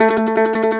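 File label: MANDOLIN TREMOLO, Plucked Strings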